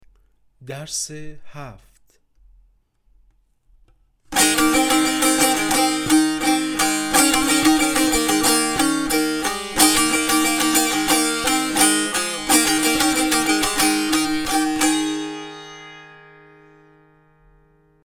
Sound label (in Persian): مشق تنبور